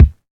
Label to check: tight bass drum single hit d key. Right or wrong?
Right